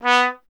Trumpet B; Miroslav Vitous - Solo Instruments VOL-1

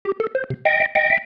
Single Riff, Organ

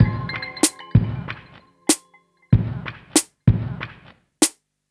Smooth, R&B Drums

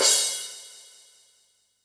CRASH, Yamaha DD-65 CYMB

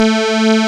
CZ Syn String A2; Proteus 2000; Digital Sound Factory Proteus 2000

